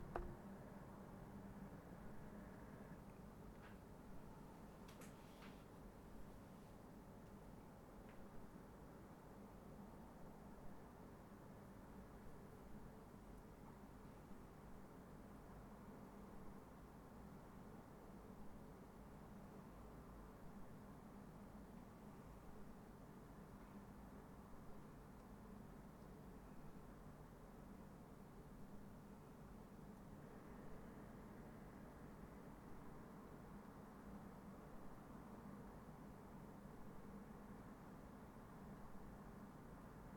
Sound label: Room Tone Large